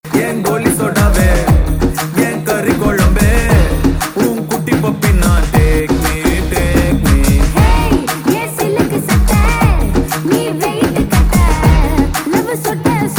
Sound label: male; tamil; maari 2